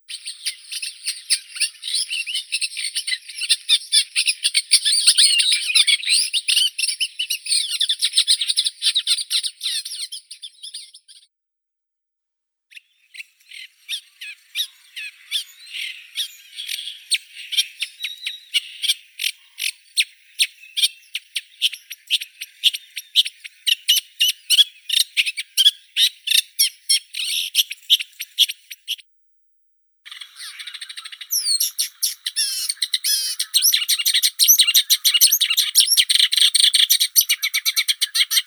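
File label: reed warbler, acrocephalus scirpaceus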